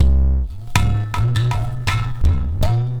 Udu

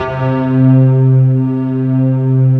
JAZZY C2

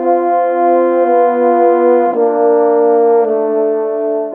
Horns, Orchestral, Rock Pop; Sonic Foundry (Sony Creative Software); Orchestral Series VOL-4 - Rock and Pop

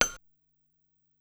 Noise Vibe, Korg Wavestation-SR; Waves 456-484

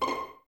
Violins C6 Pizz, String Ensembles; Miroslav Vitous; 11 Violins

